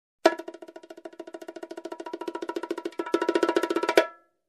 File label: Bongo crescendo